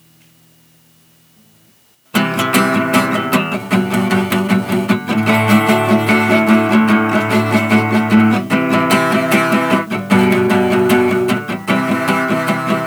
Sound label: Live Acoustic Session